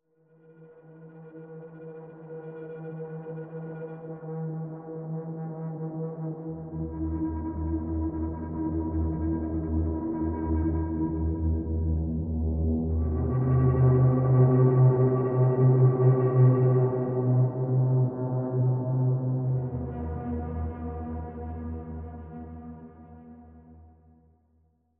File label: bridge suspense mood, music; inFamous; PS3